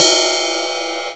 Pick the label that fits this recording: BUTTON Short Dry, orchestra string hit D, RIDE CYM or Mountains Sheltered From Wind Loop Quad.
RIDE CYM